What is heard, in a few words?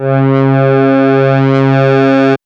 MOOG; AMG - Vince Clarke Lucky Bastard